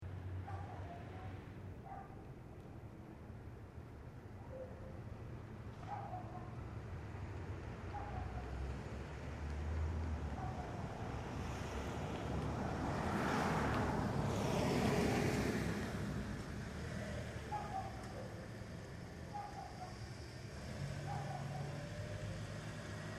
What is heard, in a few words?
Urban Residential Dogs Distant Traffic Short; Yapping dog, residential neighborhood, relaxed dayti; Traffic And Car Sound Effects